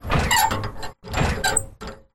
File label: valve